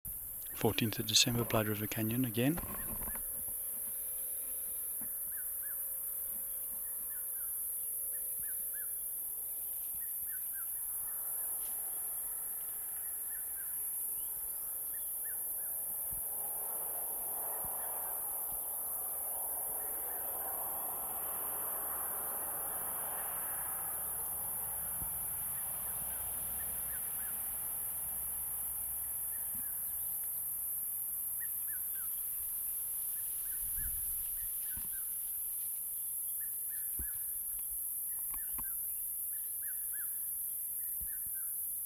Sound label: P.haglundi Blyde River Canyon